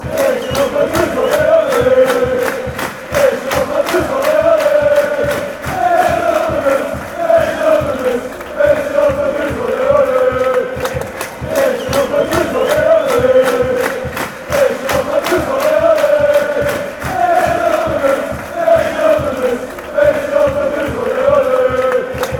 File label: chant; anderlecht